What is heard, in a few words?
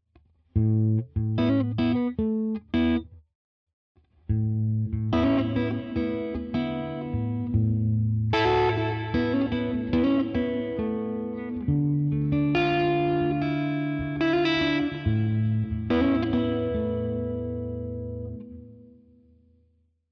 UAD DelVerb Plate